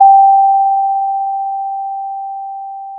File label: Sound of a doorbell